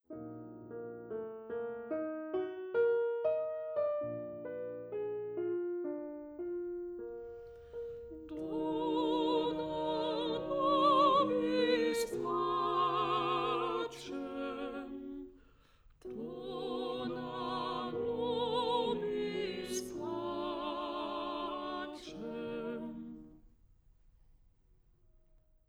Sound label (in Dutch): Bas, Agnus Dei; Rossini; oefen CD; Onversterkt